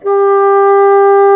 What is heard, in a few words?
G4, Bassoon Swel; Roland LCDP04 Orchestral Winds; WND Bassoons